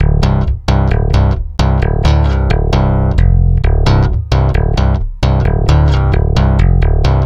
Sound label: Stick Loop, Stick Bass